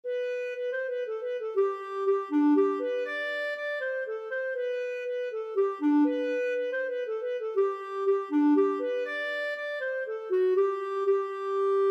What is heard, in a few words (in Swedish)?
Polska